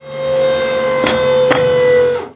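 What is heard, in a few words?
tank end movement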